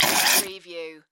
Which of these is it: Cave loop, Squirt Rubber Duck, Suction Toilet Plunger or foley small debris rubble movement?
Squirt Rubber Duck